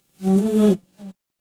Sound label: animal insect